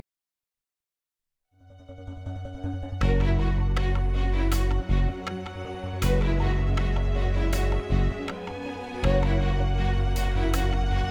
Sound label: Menu Loop; bakugan champions of vestroia 2020; switch gamerip